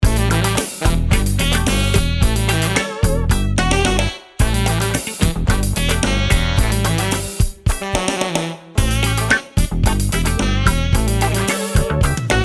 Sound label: Saxy Funk